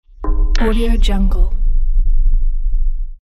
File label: data signal